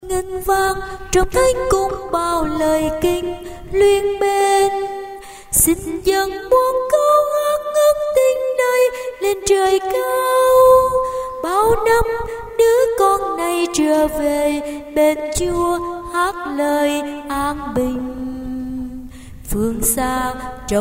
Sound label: sop alto